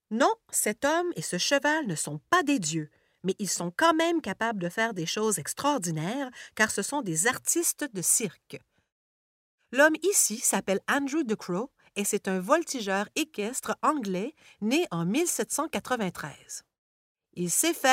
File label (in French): clairon; circusdisciplin